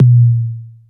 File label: KOMMERZDRUMS